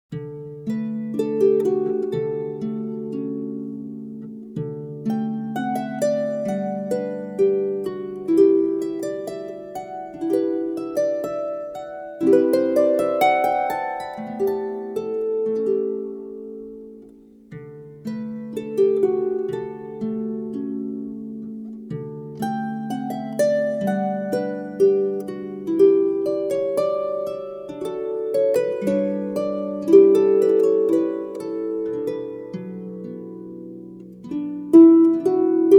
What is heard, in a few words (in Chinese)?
竖琴名曲; shuqin